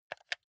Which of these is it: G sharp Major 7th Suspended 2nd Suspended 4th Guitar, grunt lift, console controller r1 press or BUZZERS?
console controller r1 press